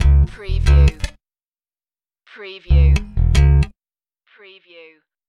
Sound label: Hip Hop Bass Guitar Loops 90 BPM - C